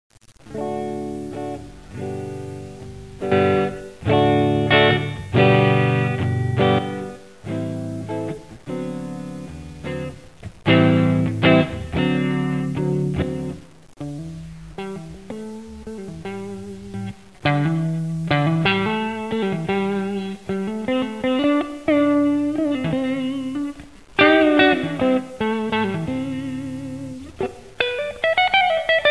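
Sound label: clean strat; AMZ FX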